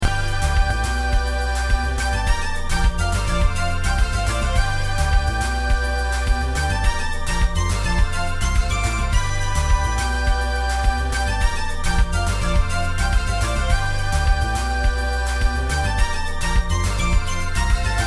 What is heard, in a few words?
Victory Fanfare Loop; RPG Maker MV